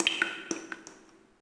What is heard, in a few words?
DRIP; DAoC; Toa; Gamestar